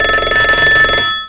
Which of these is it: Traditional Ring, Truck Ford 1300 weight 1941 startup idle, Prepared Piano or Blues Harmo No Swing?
Traditional Ring